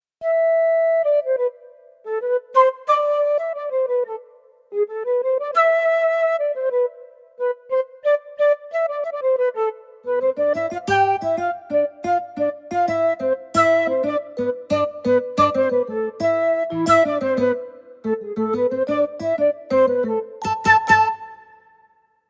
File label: Latin flutist; Montage; flutes